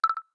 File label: Dripping; Meitu; V7